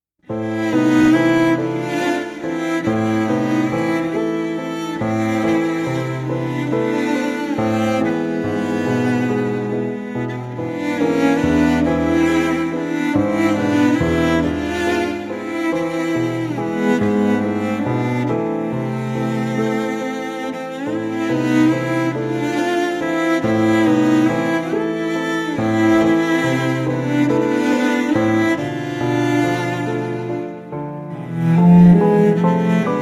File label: Memories Cello